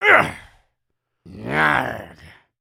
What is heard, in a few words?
knockdown; creatures organic